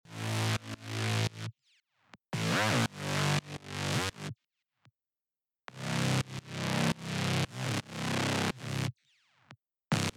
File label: bass